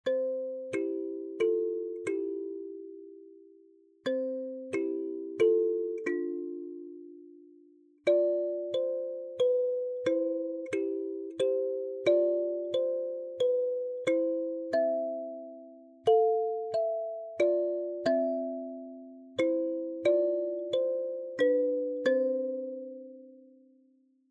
kalimba

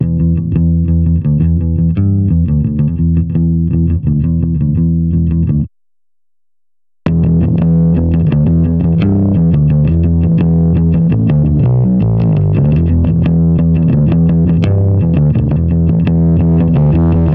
bass

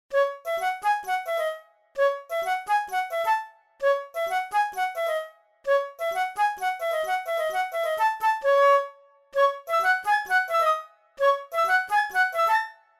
flute

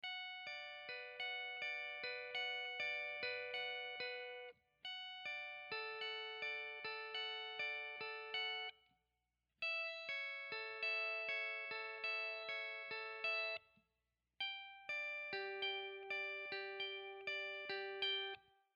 strato wet; Chiqui Audio; Free Wet Arpeggio 4 100 Bpm B Min; Fender Stratocaster

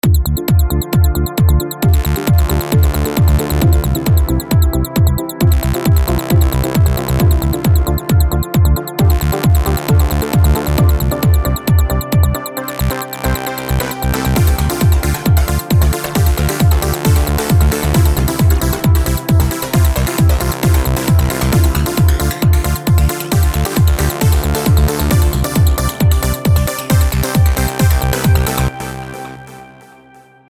trance

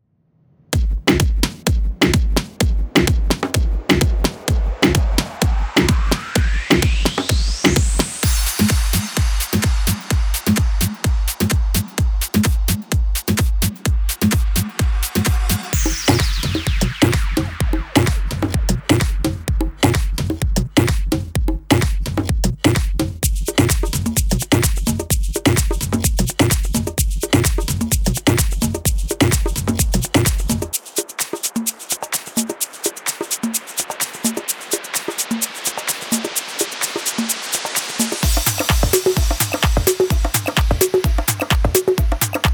tribal elements

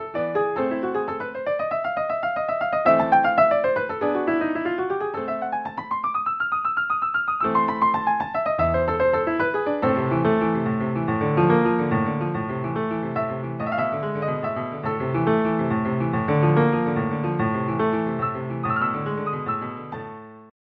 kawai 600 grand piano

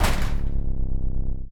aluminum 808 sub kick

